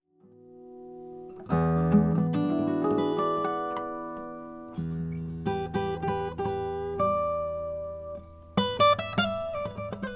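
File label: SJ cutaway Indian rosewood acoustic guitar; charis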